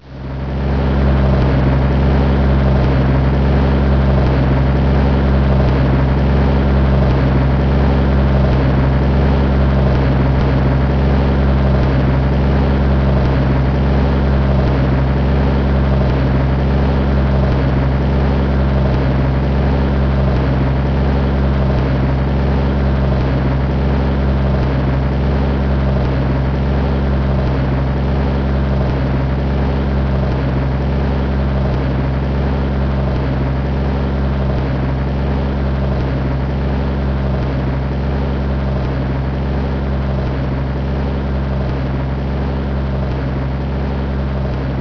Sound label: crawler; race into space